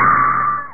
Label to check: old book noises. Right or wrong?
Wrong